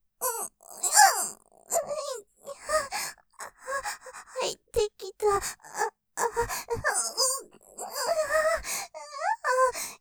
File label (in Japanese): 喘ぎ 挿入 入ってきたぁ; ステレオ